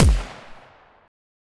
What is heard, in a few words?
head pistol shot; weapons; Half Life Alyx